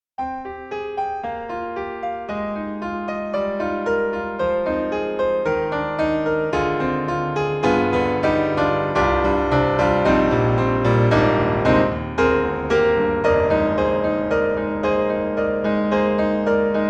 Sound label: Bosendorfer 290 Viennese Piano and Hall; Steinberg The Grand 3